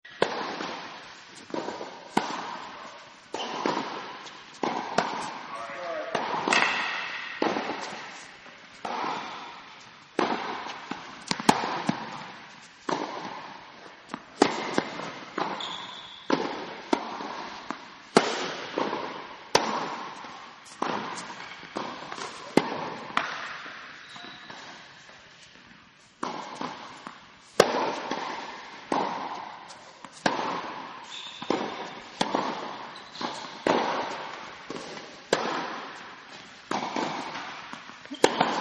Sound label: Tennis Singles Indoors; Partners In Rhyme